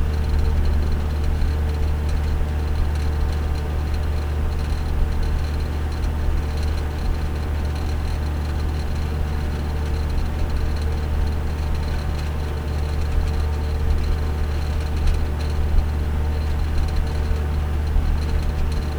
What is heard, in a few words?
rattle vent; city snow2